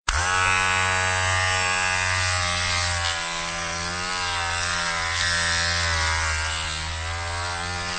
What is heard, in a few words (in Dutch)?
scheerapparaat